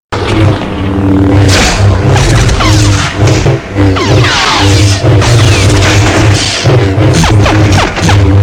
Lightsaber

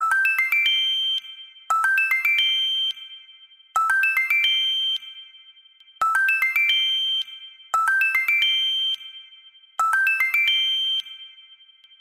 Elegant Sms

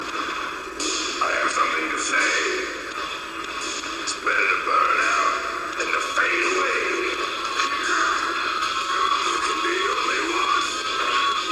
Alert Tone; Kurgan

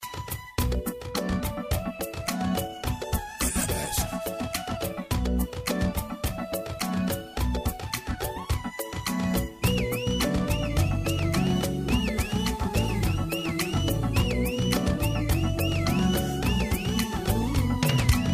Best Trance

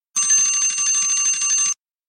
Classic telephone

